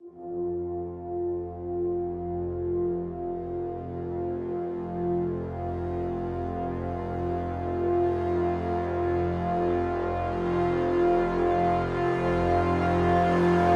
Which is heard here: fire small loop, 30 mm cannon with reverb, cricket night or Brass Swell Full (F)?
Brass Swell Full (F)